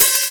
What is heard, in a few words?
raw open hat f key, Royality free open hat tuned to the F note. Loudest frequency: 8646Hz